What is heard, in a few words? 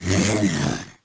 confused; Crypt of the NecroDancer